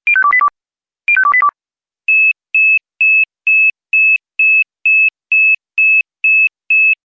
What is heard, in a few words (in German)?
fuenfton